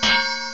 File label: cowbell